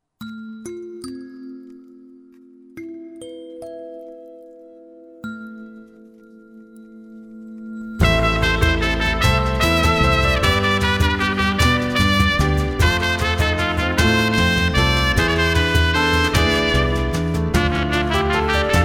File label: Latin Dance